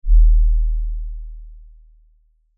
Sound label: LFE Ultra LFE Hit, Lfe Ultra Low Bass Hit Impact; Deep Tone; Sound Design